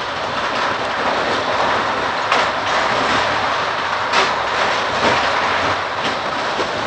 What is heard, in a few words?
RUN, Train, R62 Kawasaki; OpenBVE